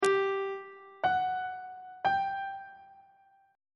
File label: f double sharp dim8 perf8 intervals; on treble clef